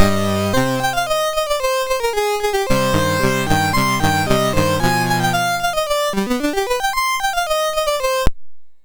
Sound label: Violin, MOS6581R3